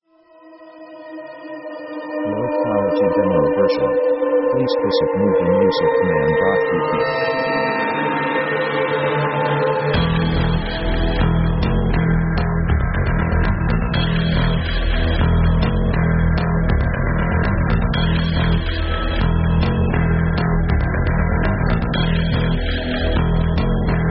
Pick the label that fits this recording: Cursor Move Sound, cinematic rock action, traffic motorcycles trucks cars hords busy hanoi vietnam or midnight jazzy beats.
cinematic rock action